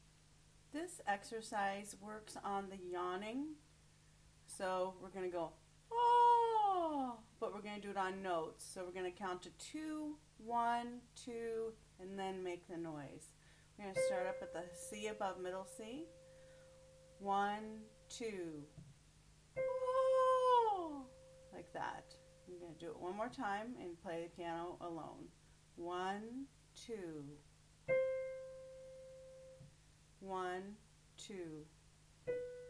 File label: yawn, warmups